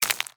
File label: creature trivalve scuttle slow front legs